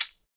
SHEKE, Afro Shakers, Shekeres

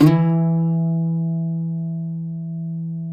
CUATRO E 3, Guitars; Spectrasonics; Hans Zimmer Guitars VOL-2

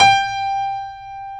G4 piano; AKAI S-Series CD-ROM Sound Library VOL-8

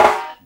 SD, Dance Drums

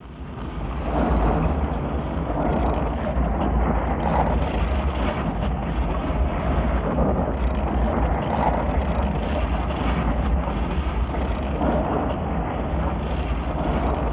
ROCK RUM; ROCK RUMB; Total Control - Action Cutz

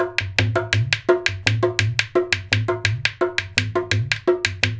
DHOL, Indian Traditions; USB Soundscan vol.45; AKAI

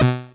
Drums, Hits; DCM8

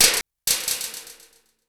2nd Drop, Drum & Bass; Total Drum&Bass; 90 s Sample CDs; Hits & Bits

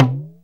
WATERDR LO; Back In Time Records; Big Bang; THE WORLD

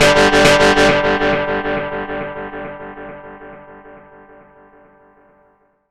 FX DIST; FX DISTO

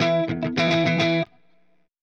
Clean Funk Guitar

